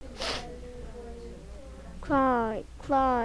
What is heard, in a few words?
cry